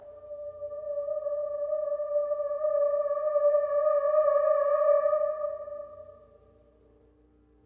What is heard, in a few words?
Slimey Prophet One Shot; Vignette Kit